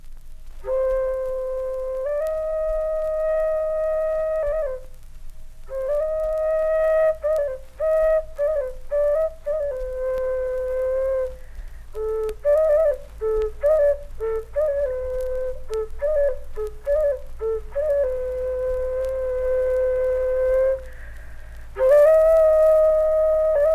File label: Music of the nose flute; Tonga